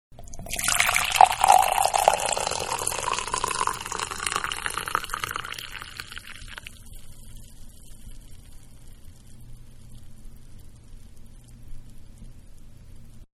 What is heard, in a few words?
Coffee Pour Into Mug; Kitchen (Sound Effects:Household); Manhattan Production Music